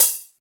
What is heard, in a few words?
juicy closed high hat sound g sharp key